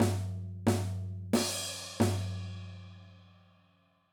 Quarter Note Example

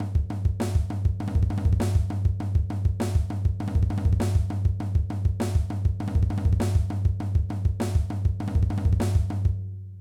Impressive Beat

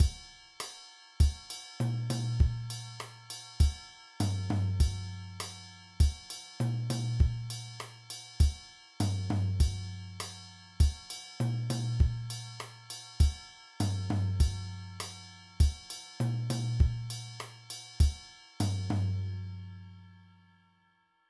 Mambo Beat, drum

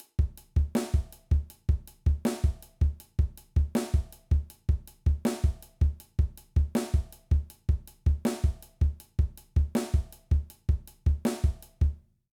Kick Drum Technique Exercise

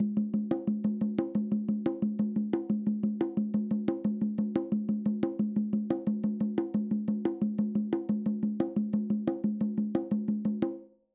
Conga Pattern

